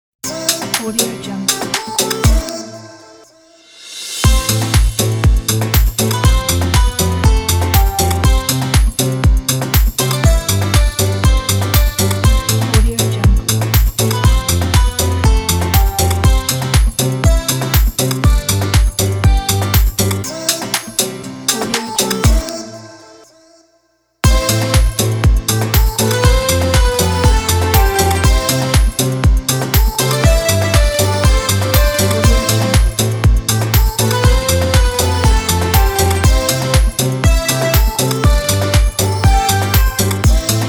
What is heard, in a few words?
modern italian pop music